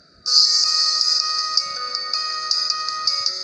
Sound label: Sms Ringtone; Infinix Zero 5G